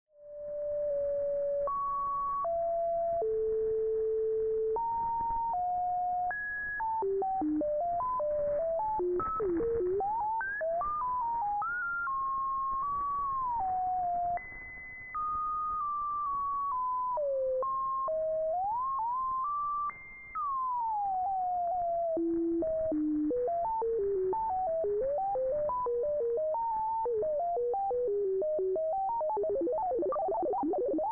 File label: Water Drops; TTSH Patchbook